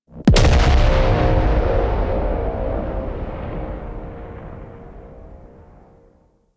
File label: Dropship Warp In Boom; Warframe; Venus